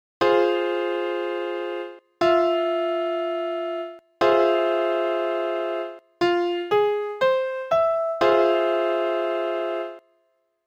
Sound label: Min Maj7